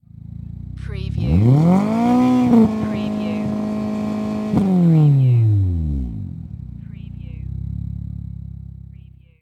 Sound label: MITSUBISHI ECLIPSE EXHAUST LONG REV